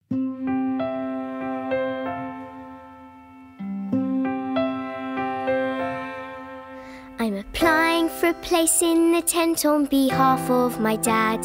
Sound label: vocals